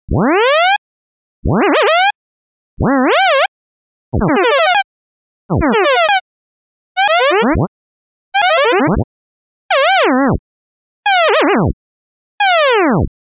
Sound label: Whaaat Siren